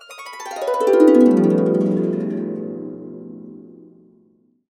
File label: B, Harps; USB Soundscan vol.62 - Complete Harps; AKAI